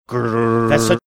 making sound driving over grated surface of bridge